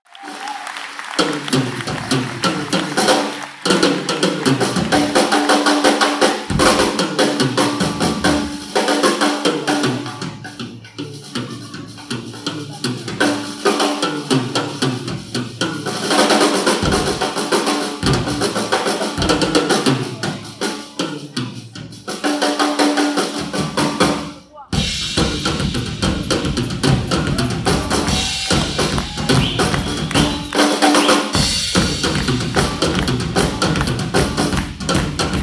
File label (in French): batterie